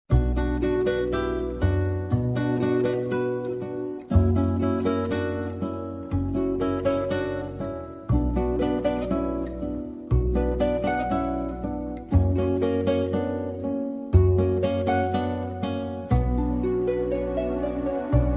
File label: soft violin